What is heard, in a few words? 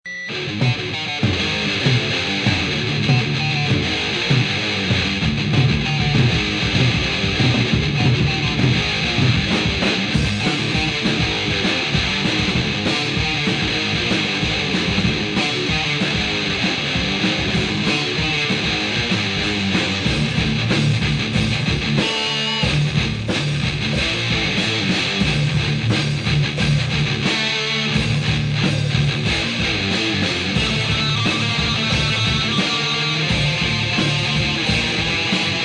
metal jams